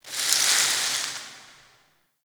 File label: Tower Branch Disturbance; The New War; Ambience; Cetus; Unum Tower